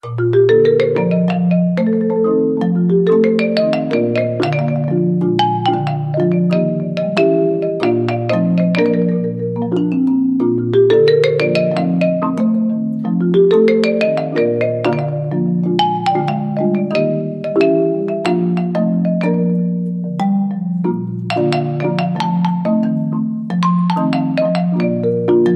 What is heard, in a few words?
Solo Marimba